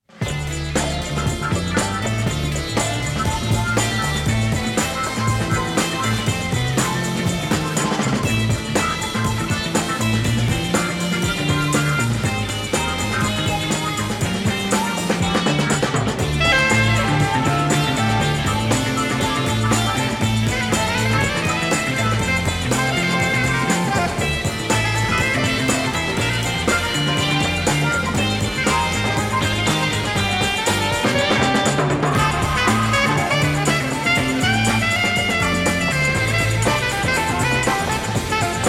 Rock Music (Fast); Pope Joan

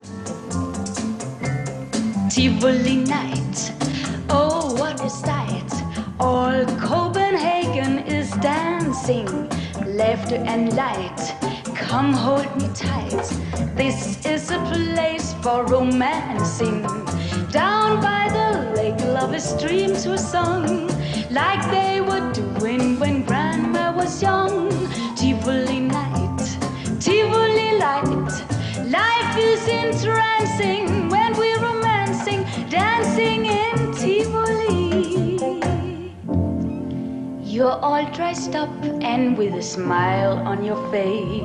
Vocal; Tivoli Gardens / Tivoli Nights; Reptilicus

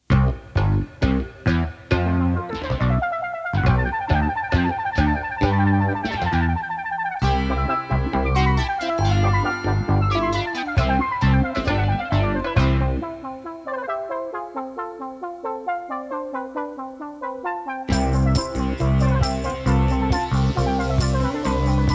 Mozart Piano Concerto No.21, 1st mov.; Main Theme; Whiz Kids

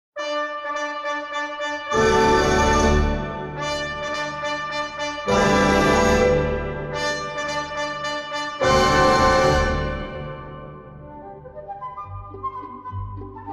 Waltz; The Maxim Trilogy

